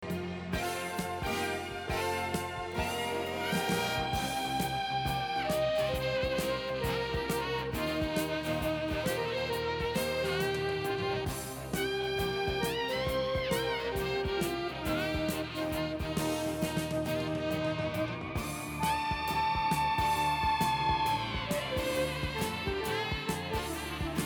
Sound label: Flute Solo